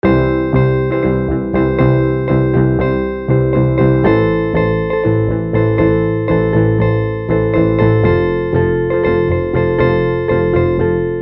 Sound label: bass